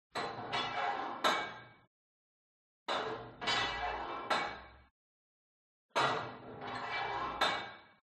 GYM Put Weight Onto Barbell Reverby Quick; SPORT; smartsound

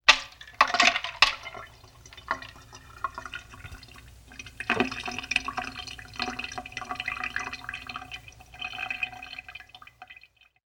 food drink pouring liquid and ice into sink; sound effects one

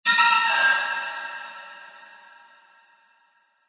hit violin stab reverb shock jumpscare

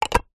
food tin small tuna stack on other; zapsplat

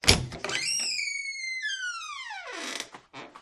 household kitchen door open creak squeek

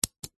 plastic clip mechanism click; foley; zapsplat

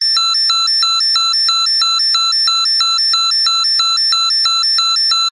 emergency alarm siren

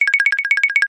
cartoon run light footed fast wooden; zapsplat

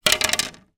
plastic phone cover down on surface; foley; zapsplat

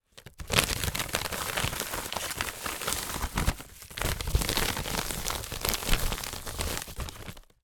office envelope padded movement hand

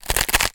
food potato chip crisp crush; zapsplat

